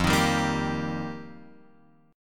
F 7th Suspended 2nd Suspended 4th, Guitar Open F, F7sus2sus4 chord; strummed; JGuitar